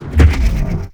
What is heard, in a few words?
shield hit; Stellaris